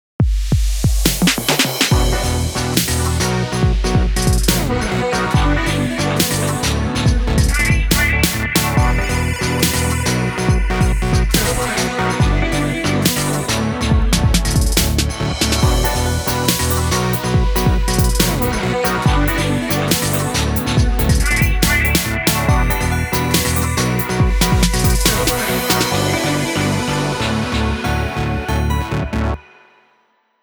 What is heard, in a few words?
METAL FEARS